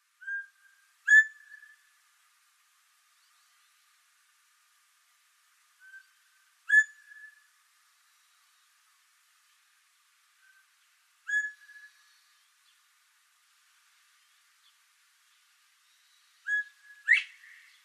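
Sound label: korinuzura, Northern Bobwhite; koe; inba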